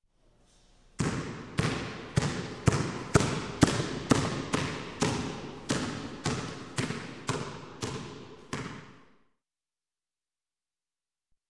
Basketball Dribble; Sound Ideas 2000 Series

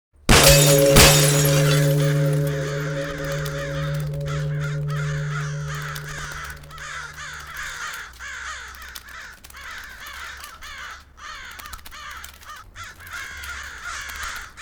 Crow Book Shelf

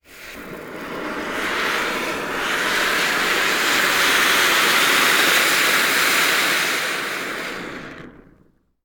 Fuse Flare; Sound Ideas; Guns

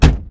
car door close, street traffic; best service Blue Box

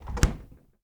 DOOR Fridge Close; mono; Universal Sound FX; DOORS & GATES

